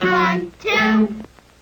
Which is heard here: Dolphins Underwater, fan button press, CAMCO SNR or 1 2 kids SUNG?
1 2 kids SUNG